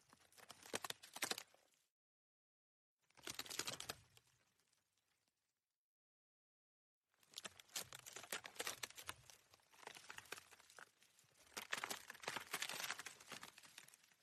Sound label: stepping on branches